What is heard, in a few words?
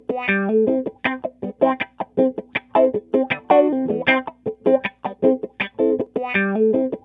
MELLO JAM, Funk Gtr & Wah; PlatinumPhattCD2; E-MU Producer Series Vol. 8 – Platinum Phatt